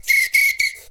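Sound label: foudang groove; Best Service; World Colours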